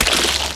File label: mined, mining; cuttlepop; Factorio